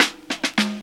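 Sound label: snare drum 105; ProSamples vol.34 - Hip Hop & RnB 3; Sexy Susan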